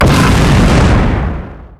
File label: thunder lizard stomp; weapons; dota; creep; neutral